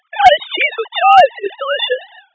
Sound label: lpc synth